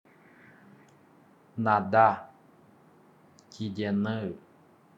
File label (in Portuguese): nadar